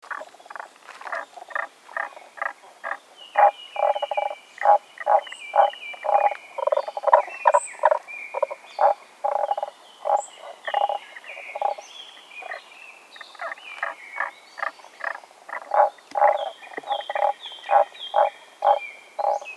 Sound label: Rana tagoi Turdus cardis